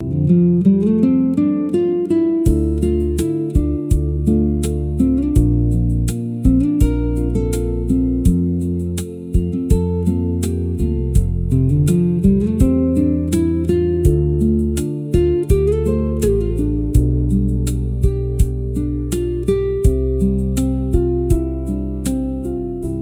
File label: loop; bgm; education